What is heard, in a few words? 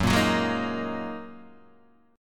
Gb 6th Add 9th Gb down Guitar Open D